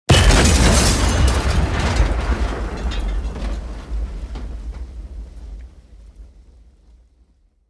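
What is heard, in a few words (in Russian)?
взрыв машины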